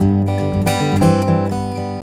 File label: GUITAR 120; Best Service Advanced Media Trax Modern Composer